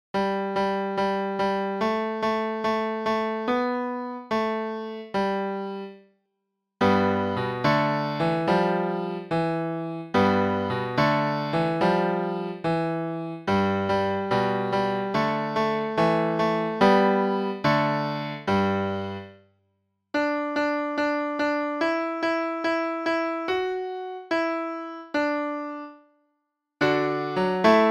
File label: blues hot cross buns, 4 strings